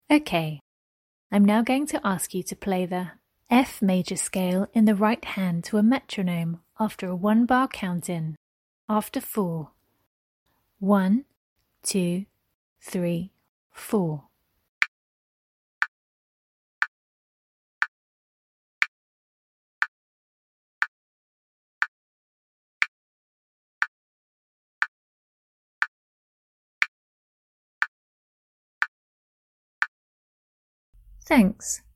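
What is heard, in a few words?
F major scale right hand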